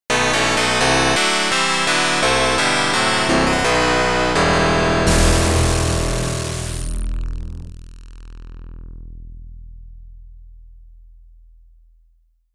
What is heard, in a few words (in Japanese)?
敗退; Romance of the Three Kingdoms IV PK; PC-9801